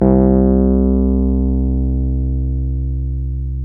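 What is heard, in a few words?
Wurlitzer electric piano C2; Keyboards of The 60's and 70's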